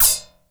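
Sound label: plastic splash; 90 sSampleCDs; Crypton - DrumBase5000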